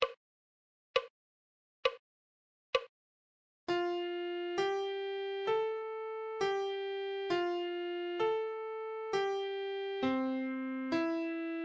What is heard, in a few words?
musical reading in treble clef Adagio